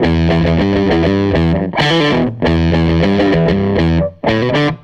SG Guitar Loop

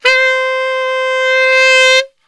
C 2 SAX, Brass Super Section; Best Service

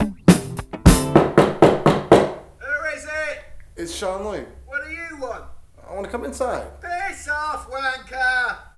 104 bpm; 90 s Sample CDs; Planet of the Breaks

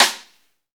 SNR, Snare; Club-50 - Foundations Roland; Ext. Snare 4

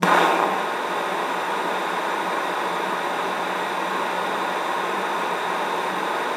luft, alto sax; Xsample VOL-2

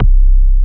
SUB BASS; E-MU Formula 4000 Series Vol. 2

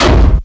TOM ELECT; USB Soundscan vol.27; Ragga & Dub Reggae; AKAI

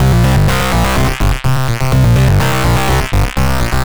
synth 125 quadflt C Ab G; Transmission-X

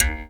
jaw, Didgeridoo and Other Primitive Instruments

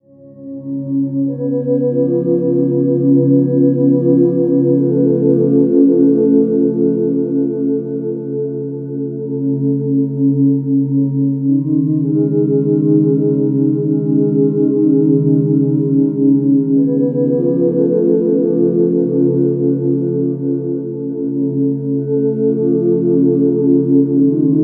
Ambi Cloud, Keyboards

